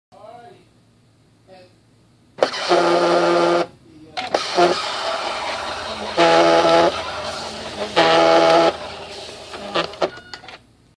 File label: Scanner